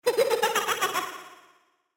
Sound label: laugh